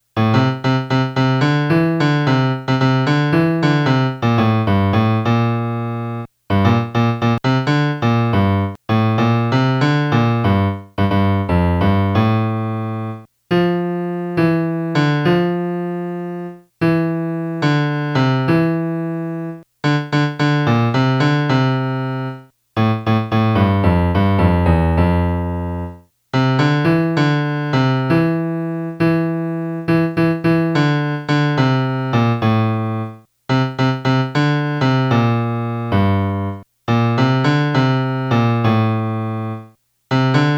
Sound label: melody